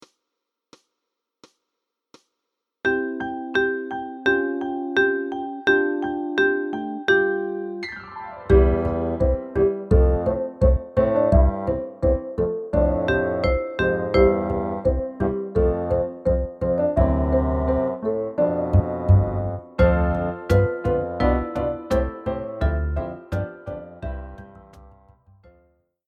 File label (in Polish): Jesienny deszcz instr